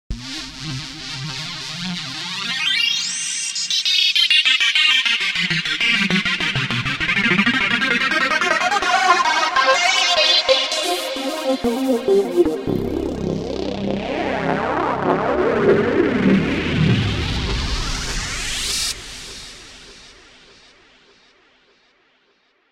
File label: Big jam lead